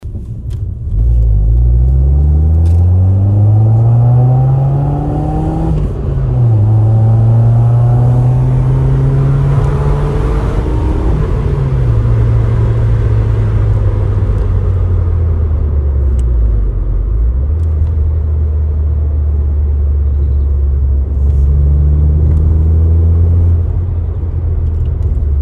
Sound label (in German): 4Zyl Beschleunigen